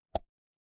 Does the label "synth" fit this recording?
No